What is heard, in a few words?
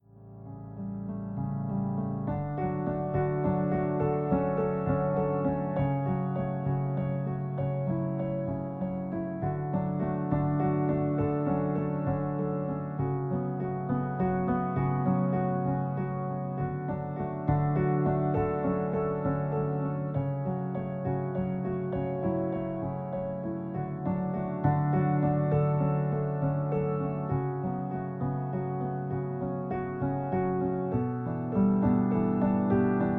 piano